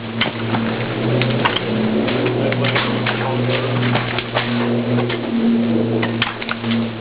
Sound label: Crew Work